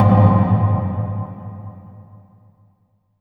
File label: rubber wal, amb. mallets; Spectrasonic Distorted Reality 1